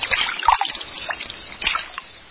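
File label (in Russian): вода бурлящий звонкий близкий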